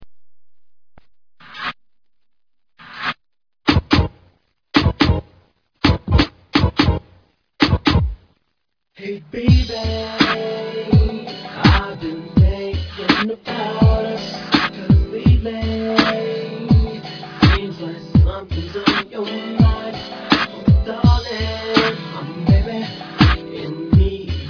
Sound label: funk